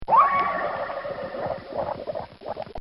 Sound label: Cartoon Sound Effects Bubbles